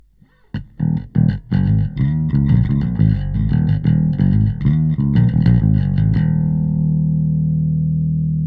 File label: Bass; smart comp